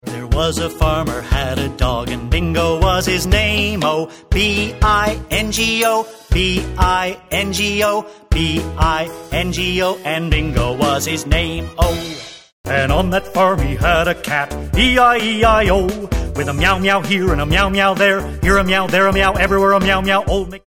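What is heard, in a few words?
American, Songs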